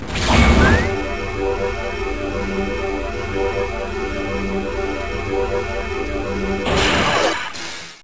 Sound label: Morphing; Saban